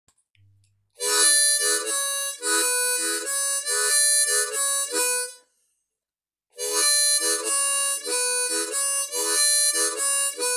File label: tongue